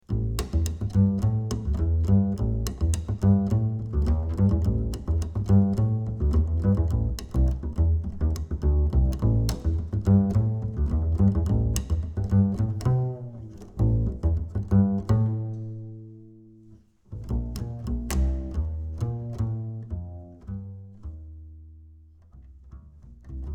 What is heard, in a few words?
Ac Bass 25cm L Plucked High Mid